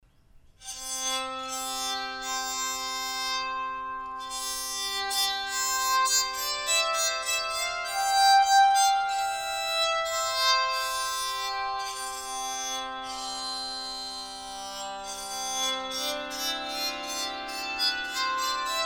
tenor sound, bowed psaltery; birdseye

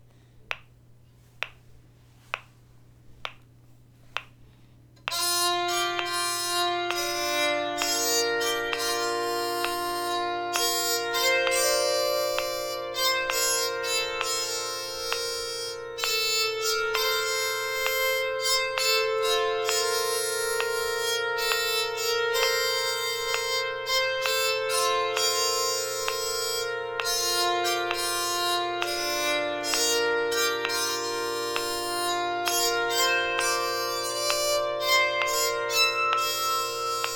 PS, bowed psaltery; Rock of Ages

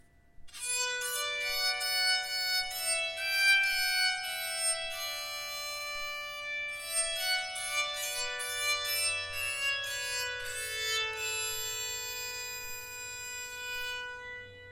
song, bowed psaltery; Ringing Strings Bowed Psalteries

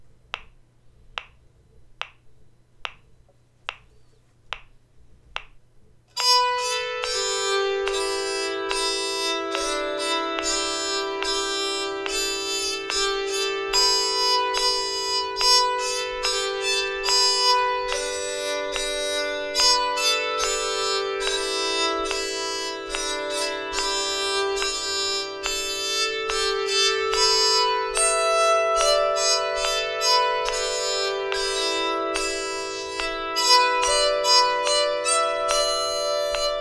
PS, bowed psaltery; The Parting Glass

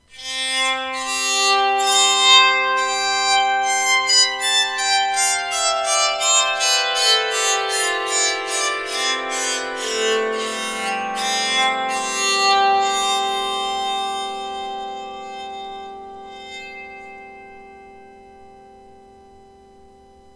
scale, bowed psaltery

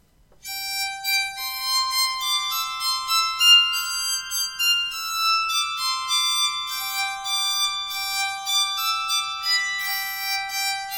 song, bowed psaltery

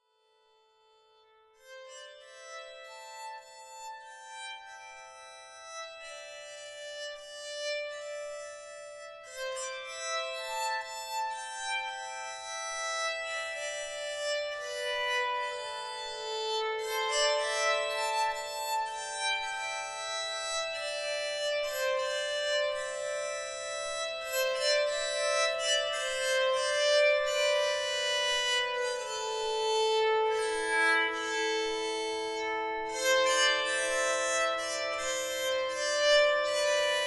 tenor warble, bowed psaltery

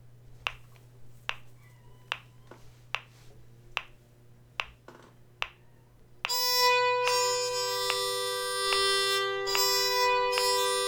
Swing Low Sweet Chariot, bowed psaltery

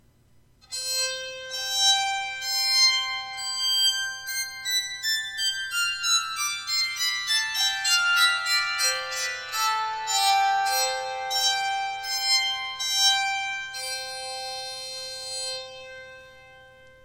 scale, bowed psaltery

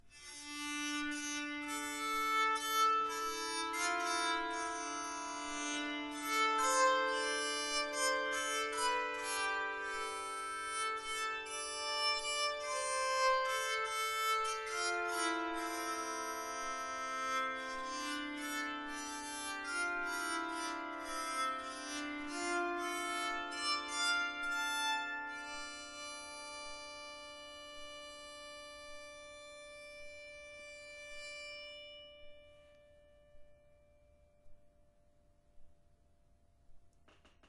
Tenor, bowed psaltery

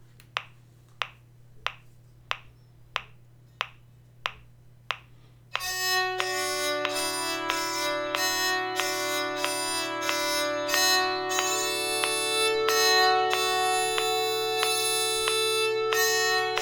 Little Liza Jane, bowed psaltery

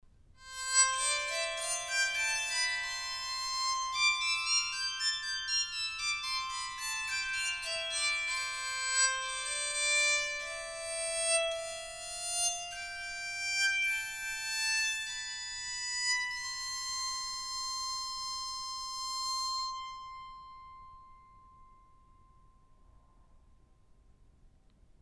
birdseye sound pyro, Soprano bowed psaltery

